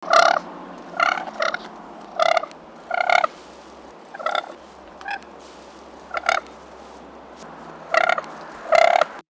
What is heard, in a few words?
Siberian Crane contact